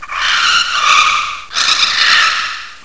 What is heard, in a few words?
taunt, bug; scourge